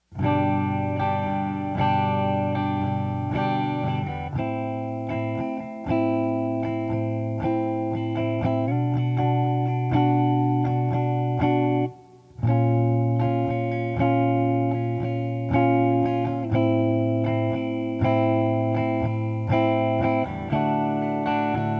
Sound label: guitar